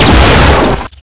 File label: Shock Rifle; ASMD